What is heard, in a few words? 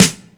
Beat Box, E-MU Pro-Cussion